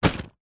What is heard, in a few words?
office pencil case full drop